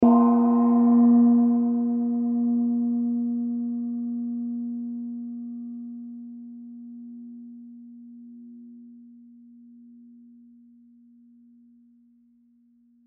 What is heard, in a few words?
Gong Hit Medium; Musical; Blastwave